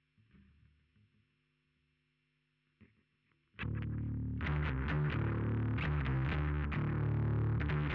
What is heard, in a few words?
Bass Sansamp